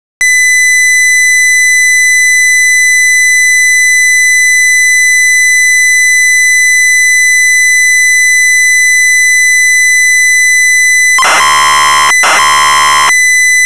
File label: MUSIC BOX; Knights